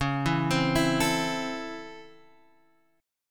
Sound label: Db Minor Major 7th Sharp 5th Db down Guitar Half Step Down